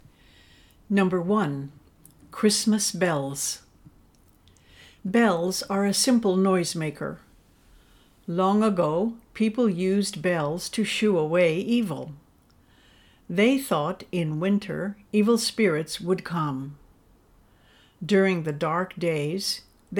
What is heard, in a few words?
Christmas Bells